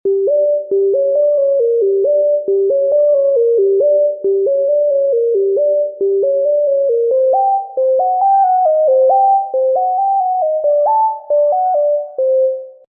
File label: Synth